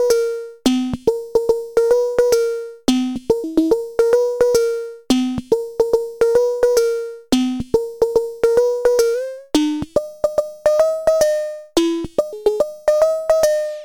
Steel Drum; Reon RE501 RX Driftbox

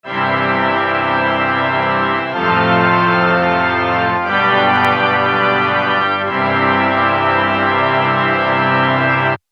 Mellotron M4000D Pipe Organ

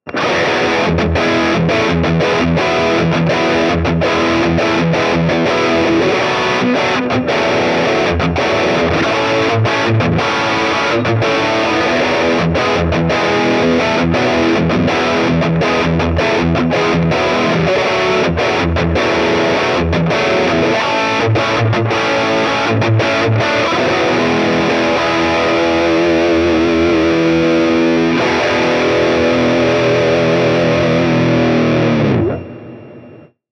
Small Session Board Highgain Rhythm

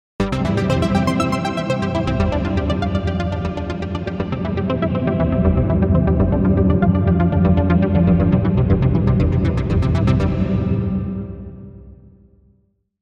Synthesizer Union Station

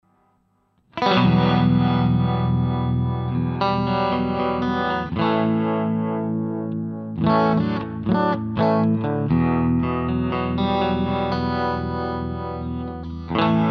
Clean Channel plus Harmonic Vibrato DI

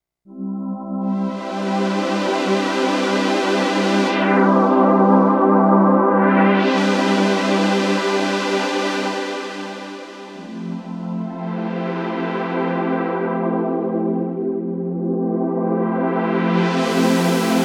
Pad; AX Edge; E Scape

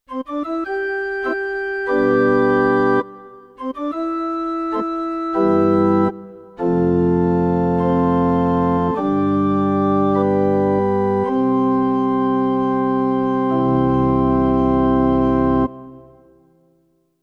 Yamaha P-225 Organ

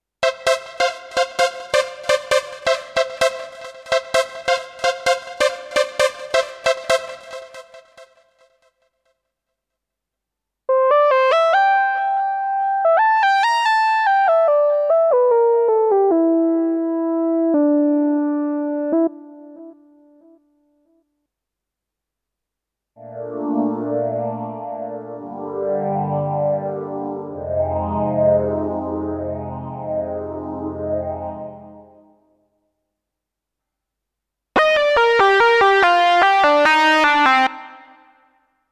Yamaha S970 Synth

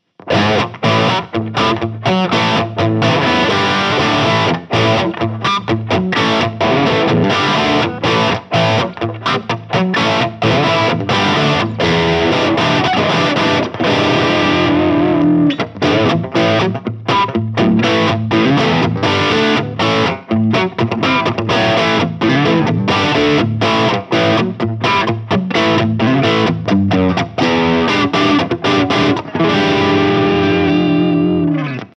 LTD Vulture OW Heavy Crunch

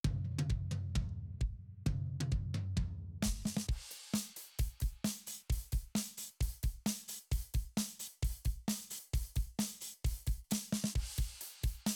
AC DRUMS OUT

